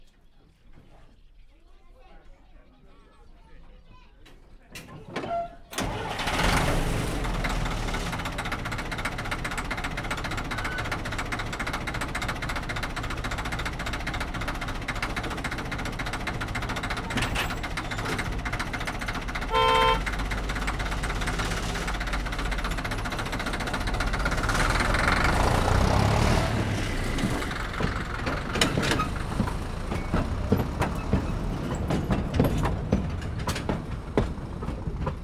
elhalado, indulasa kulso vegallomasrol, kisvasut; hortobagyi halastavak

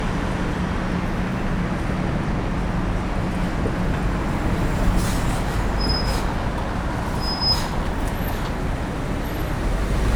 jobbról troli felsővezeték

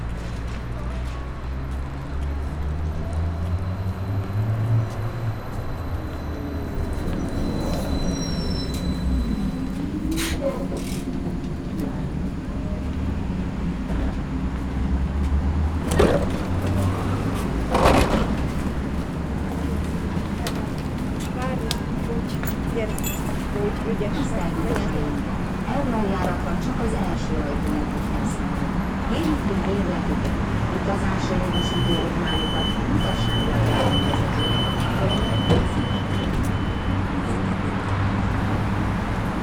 76os lehel teri megallo kivulrol, troli; jaszai mari ter fele

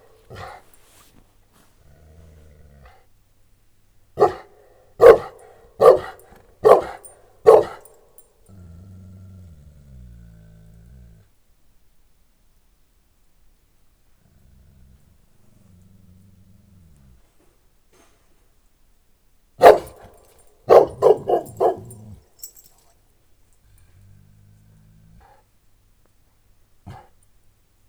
bernáthegyi moszkvai őrkutya keverék; eger turistahaz; parasztudvar

